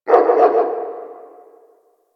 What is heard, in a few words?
Distant Ambient Dog Bark; Warframe; Ambience; Nineteen Ninety Nine